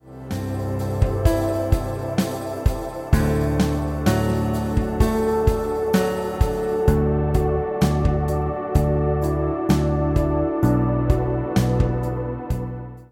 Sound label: Church Melody; church melodies volume 1